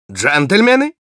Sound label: battlecry, ru